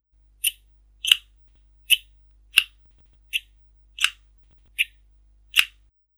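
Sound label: scissors sound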